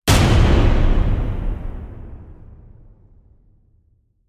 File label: Criminal Hit Epic; Ninja Tracks